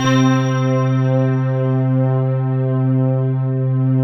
STAC, synbell

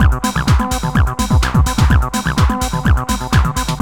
ACID JAM

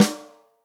rock snare chromatic, real drums

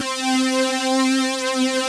fat attack; Pro-Rec - Synthsonic

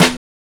Snare, Drum and Bass

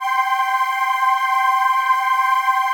digi pad, Pads

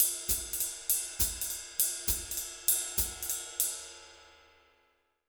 Jazz Latin Drumloops; USB Soundscan vol.08; AKAI

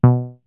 DISTORTION BASS

